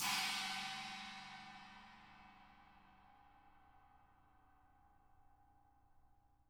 CHINA CRASH; ILIO; Double Platinum Drums 1

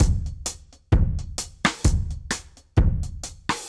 Drums B Dry 130, dub drums; musicradar

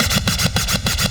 SCRATCHIN; E-MU Formula 4000 Series Vol. 2

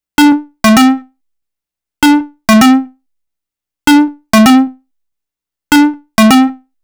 Lead, Afterhours EDM

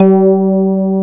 RHDS C5, FM PIANOS

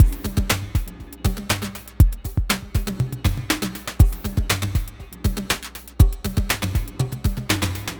Terrestrial Hybrid, Processed Percussion

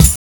KIT, Dance Mega Drums, HIP

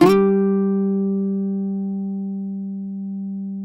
cuatro; Spectrasonics; Hans Zimmer Guitars VOL-2

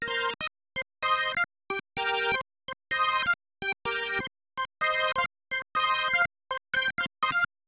125 Hammond Riffs C, Keys, Nu Jazz House; PowerFX - Nu Jazz House

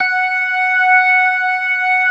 ORGAN, C3 PERC; Keith Emerson Signature Series

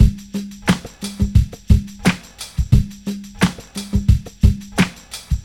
drm, Hip Hop & RnB

